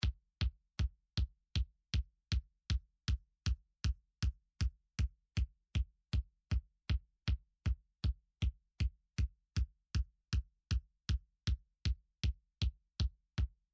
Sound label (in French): Kick